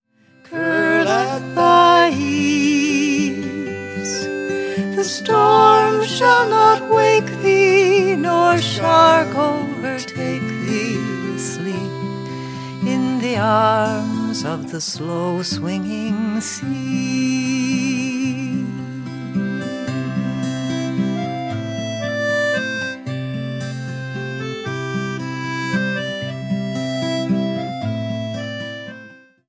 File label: Seal Lullaby; Seal Harbor